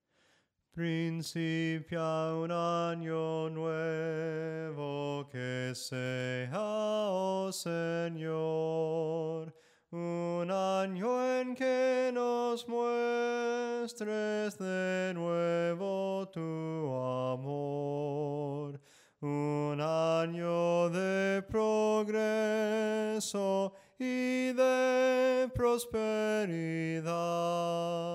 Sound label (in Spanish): SOPRANO CANTADO, himnos en melodia soprano